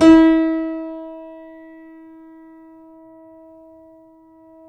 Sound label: C7 FF, The Ultimate Piano Collection; East West